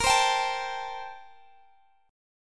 A Minor 7th Bb; Am7/Bb; strummed; chordshape

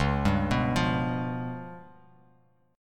Db 7th Flat 5th, Bass Standard; Db7b5 arpeggiated; JGuitar

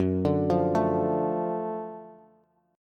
Gb Minor Major 7th Sharp 5th Gb down Bass; GbmM7#5 arpeggiated; JGuitar

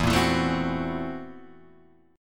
Gb 7th Sharp 9th Flat 5th E, guitar

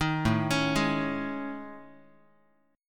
A Major 7th Flat 5th, down Guitar; AM7b5; arpeggiated; 6,5,4,6,x,x

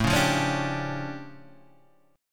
D Minor Major 11th, A

